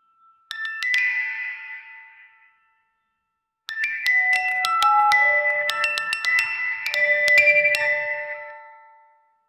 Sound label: a music box sound with, distortion